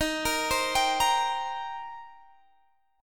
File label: Eb 6th Eb down Guitar Standard; Eb6 arpeggiated; JGuitar